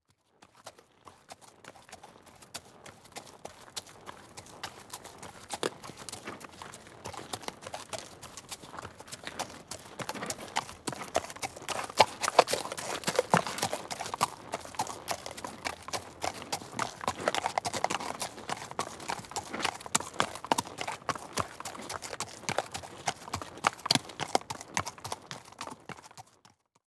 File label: Animal Horse Walk Steady; animals; AdobeCC